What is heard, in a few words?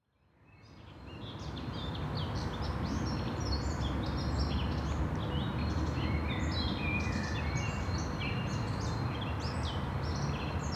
park birds traffic plane